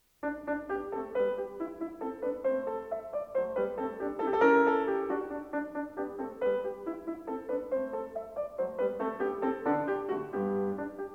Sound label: Piano Sonata No. 19 in G minor; Wilhelm Kempff; Beethoven; Piano Sonatas